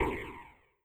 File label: aoe Blast